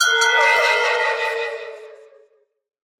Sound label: allay idle without item; mob; minecraft